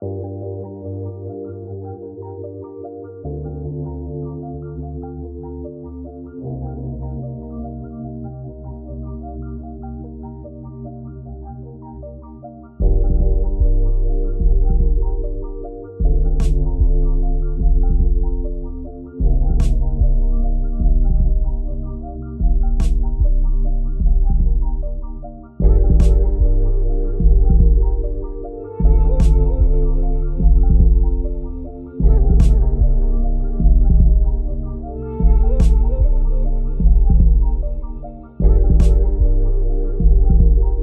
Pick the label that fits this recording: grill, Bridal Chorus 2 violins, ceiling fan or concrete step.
ceiling fan